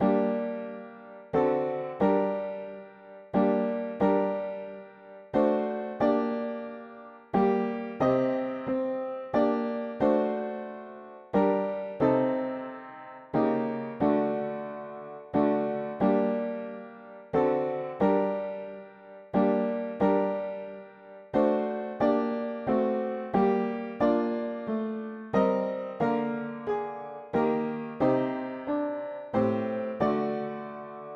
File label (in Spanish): mono piano; hymns; Himnario pequeno